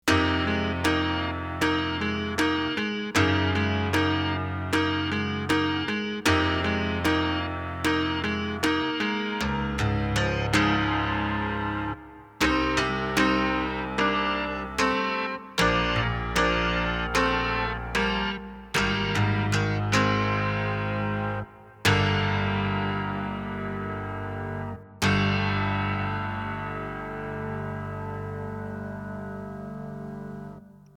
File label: Plucked Piano; mellotron